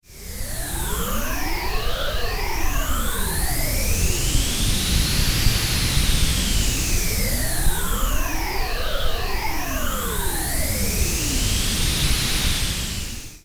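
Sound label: pink noise, phaser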